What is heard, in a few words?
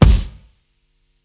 Kick, Psychedelic Drums